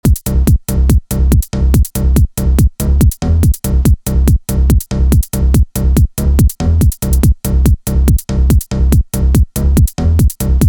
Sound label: Bass With Drums; rave generator 3 bass presets vol1